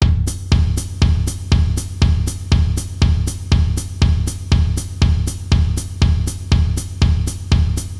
Samba 60 bpm

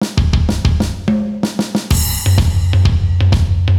Rythme

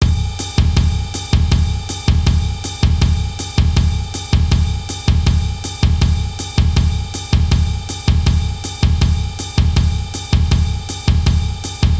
Samba intermediaire cymbale

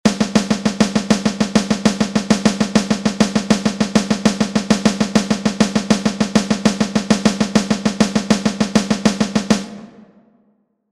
main 100 bpm

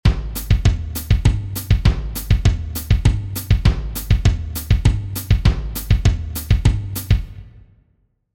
pied 2 main 1 100 bpm

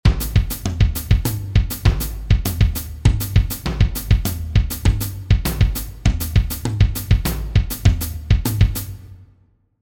pied 2 main 1 100 bpm

